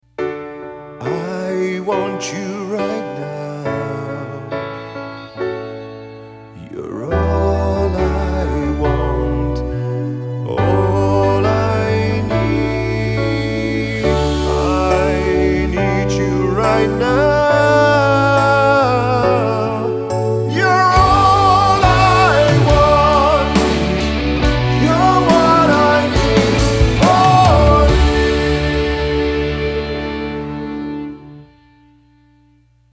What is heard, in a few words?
singing Pop; Linio